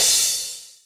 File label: drum hit finish; osu